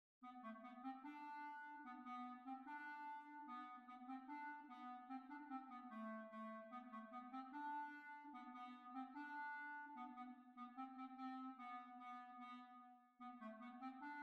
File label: Grand Hornpipe B flat